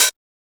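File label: house hi hat; E-Lab; X Static Goldmine 1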